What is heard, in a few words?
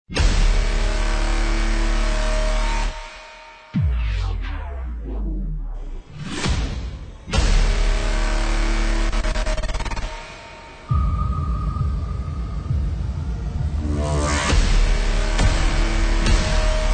Epic Minimal